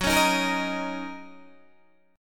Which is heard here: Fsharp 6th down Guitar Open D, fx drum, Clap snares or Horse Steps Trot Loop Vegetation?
Fsharp 6th down Guitar Open D